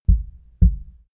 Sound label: heart beat double slow